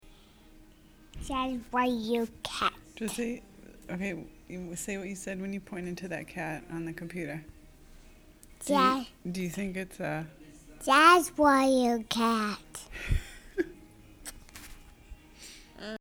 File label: jazz